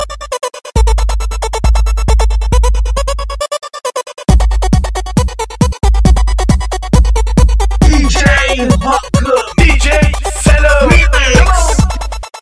Cool Bubbling

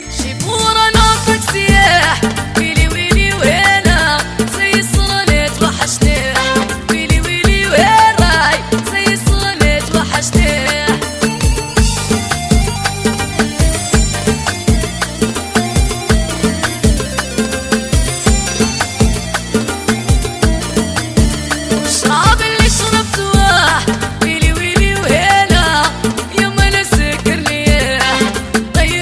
Arabic Song

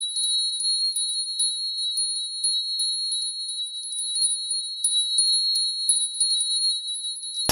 Trinker Bells

